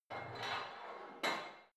barbell heavy plate put on reverby hard, fitnessstudio